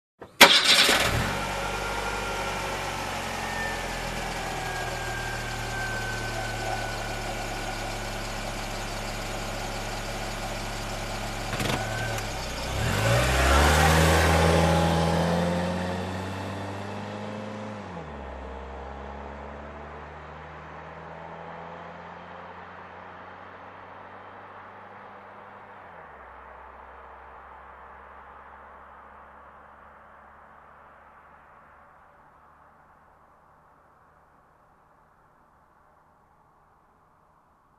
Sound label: Car Engine ignition drive away